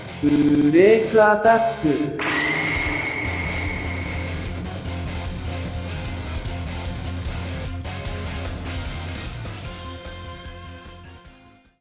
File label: break attack cornered rock